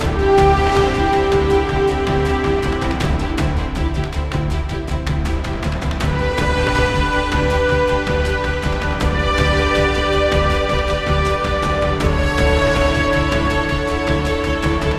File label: Mood High